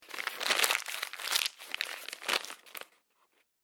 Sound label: Crunching Plastic Wrapper Noise; Crinkling Sound Effects; Foley